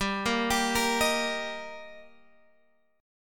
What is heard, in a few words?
G Minor Sharp 5th G, Guitar, Open G; chordshape; down